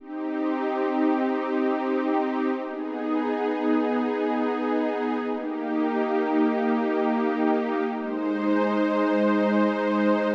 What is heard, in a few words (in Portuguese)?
mib sustentado